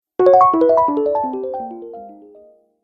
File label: Classic Fall Sound, video game sound effects; Twistedtracks